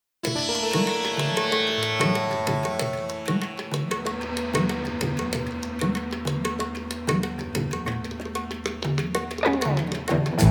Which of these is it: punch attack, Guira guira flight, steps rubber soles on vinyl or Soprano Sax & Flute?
Soprano Sax & Flute